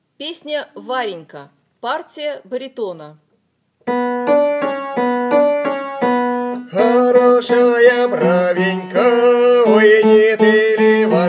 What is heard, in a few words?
Baritone; Varenka